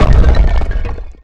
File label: Tombstone Break; Nicktoons Nitro; arcade